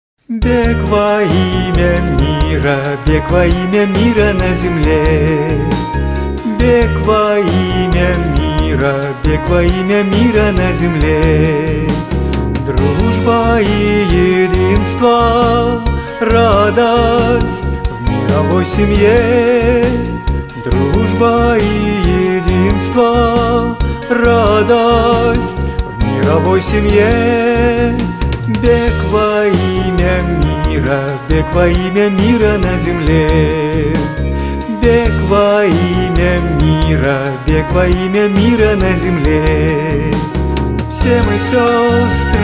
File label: peace run song rus